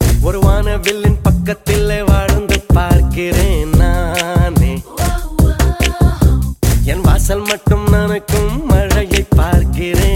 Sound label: hindi melody